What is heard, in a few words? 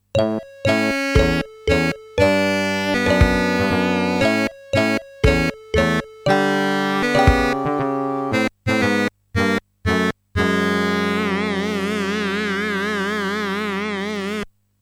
game over; sonic 16bit